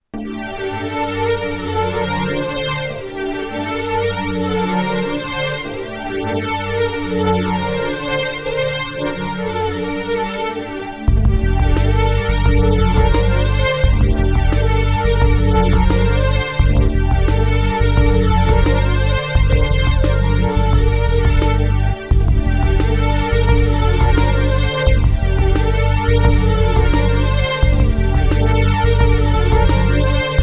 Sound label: Violins